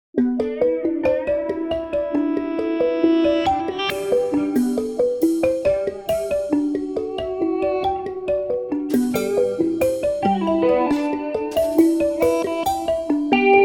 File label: chimp